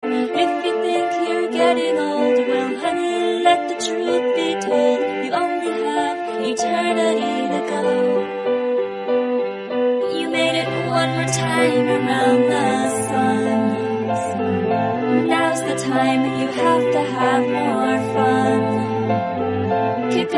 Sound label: Brother Birthday Song English Song